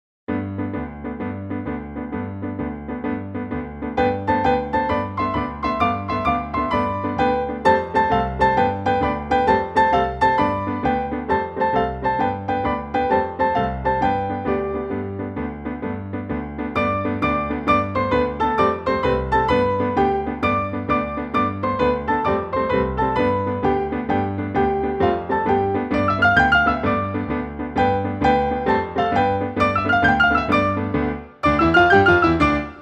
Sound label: tarantella